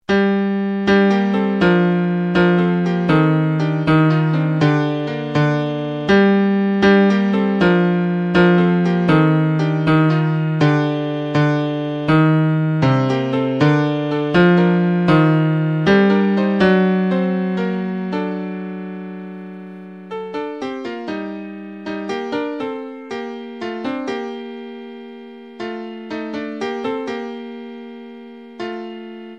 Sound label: midi bass